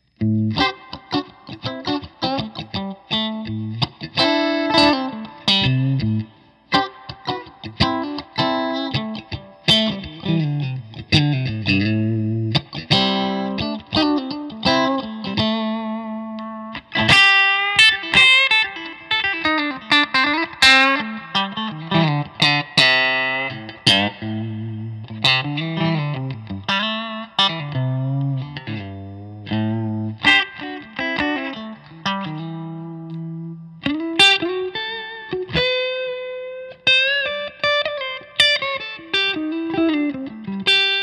Fender Custom Shop 61 Stratocaster, electric guitars, solid body; sonic blue; Paul Waller